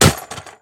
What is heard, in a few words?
weapon sniper impact; physics